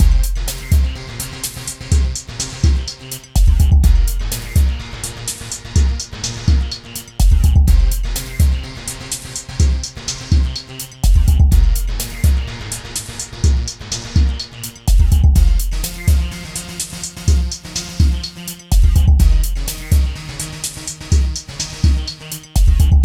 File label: Street Mayhem Looping; action